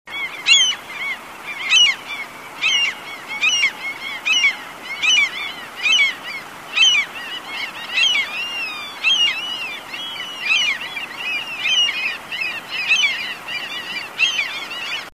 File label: Larus canus